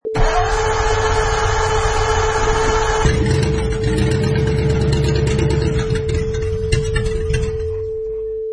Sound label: Mercury 255 CI Flathead Engine turns over then stalls